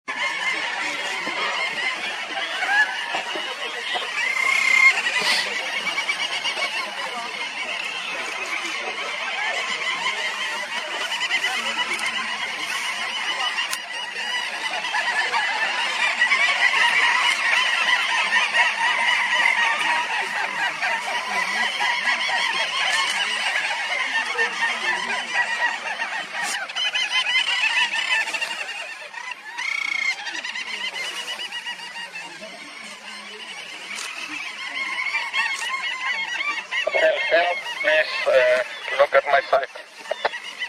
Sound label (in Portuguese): Canto do arauzinho